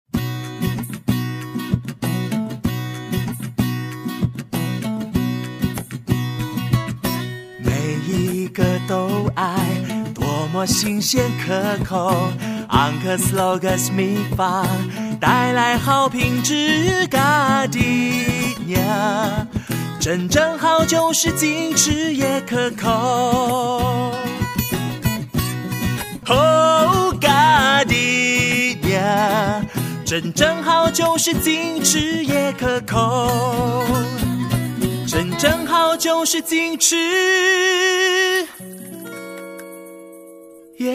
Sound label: Jingle; Gardenia